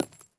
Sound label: casing bounce soft